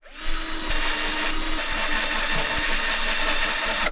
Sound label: electric screwdriver